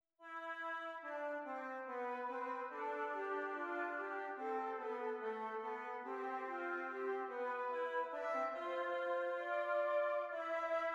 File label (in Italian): esercizi di doppio contrappunto nella decima; Ronge pagina 169